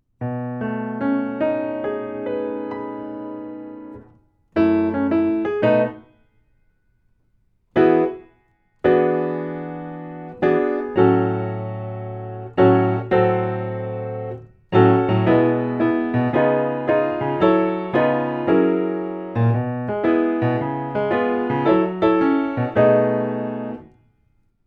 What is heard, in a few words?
Piano